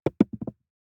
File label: DICE on Felt, Throw and Roll, Metal, 1 One Die; Samples; ArticulatedSounds; DICE Sfx Party (Extended)